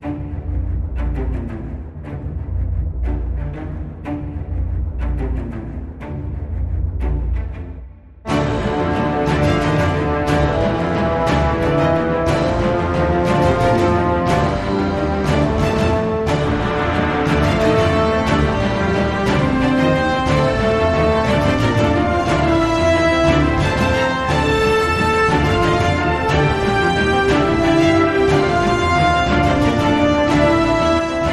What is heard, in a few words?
No Choir